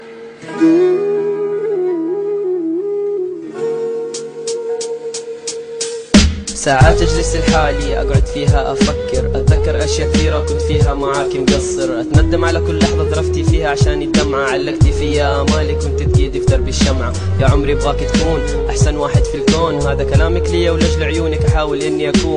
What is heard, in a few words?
Arabic Tone